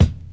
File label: loud kick sample F key, Royality free steel kick drum sound tuned to the F note; kick drum samples